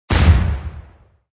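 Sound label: explode High; marvel; city under attack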